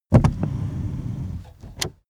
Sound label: car seat slide forward slow